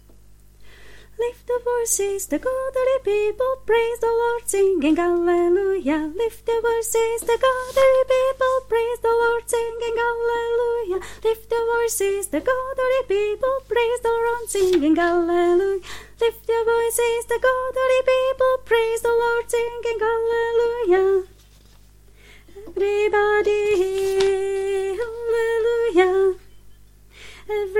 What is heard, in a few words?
Tenor; Vianoce 2018; Farnosť Letanovce